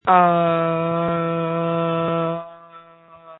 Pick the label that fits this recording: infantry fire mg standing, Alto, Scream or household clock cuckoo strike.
Scream